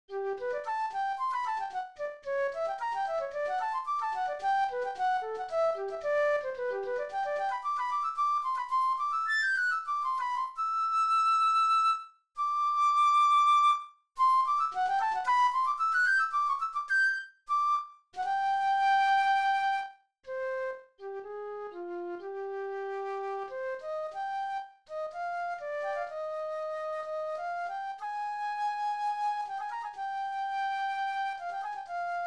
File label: Sr Flute